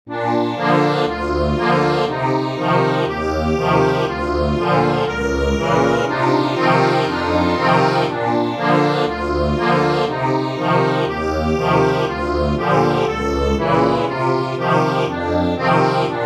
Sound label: accordion